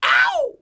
A YELL, MALE HUMAN; ILIO; Vocal Planet

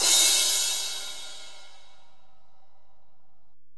Ride, Alesis DM-PRO, Drum Machines

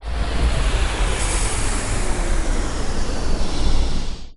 sfx sci fi; Masterbits - Switch FX

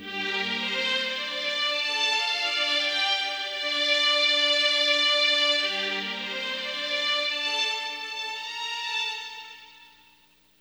Hip Hop and R&B Drumloops

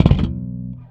Slap One Shot B, Bass; Bass X

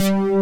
PHAT SYN BS, DANCE; Pro-Rec - Synthsonic; Z1 DANCE

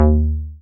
303, TB-303 BASS

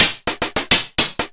909 mod CH - CLOSED HAT

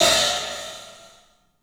club hat; 3D Sound; 3D Drums

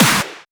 Snares; Vengeance - Essential Clubsounds Vol.4

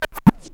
stutter, Vinyl Scratches; E-MU Producer Series Vol. 8 – Platinum Phatt

